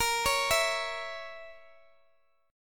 Db Minor 6th Bb

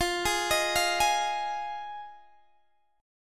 F Minor 7th, F, Guitar, Open D

